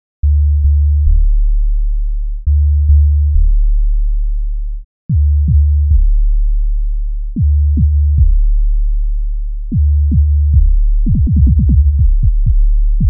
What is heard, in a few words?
z3ta piched 808